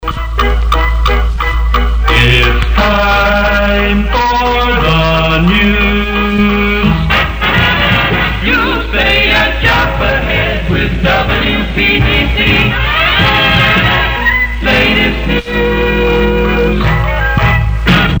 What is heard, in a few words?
jingles its time for the news; crc series 3